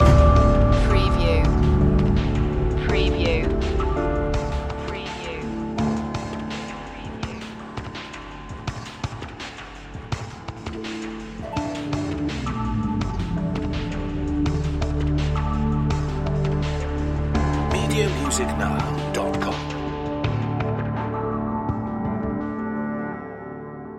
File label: no hang drum